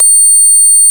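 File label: Distorted Guitar C6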